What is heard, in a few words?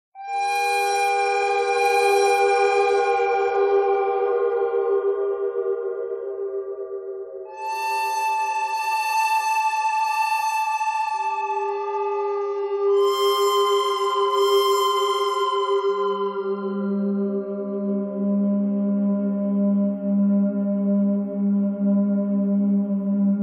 bird thread buzz; noreverb